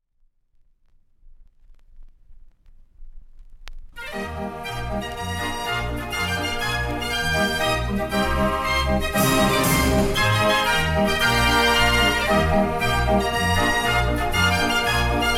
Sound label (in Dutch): draaiorgel; de lekkerkerker